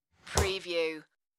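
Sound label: Kitchen Cabinet Door Close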